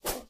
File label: miss